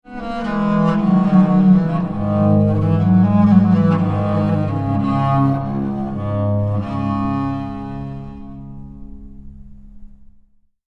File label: viola da gamba